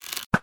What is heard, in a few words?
crossbow